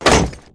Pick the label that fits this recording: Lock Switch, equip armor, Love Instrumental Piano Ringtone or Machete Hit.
equip armor